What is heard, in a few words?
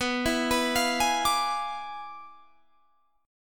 E 7th Flat 9th, B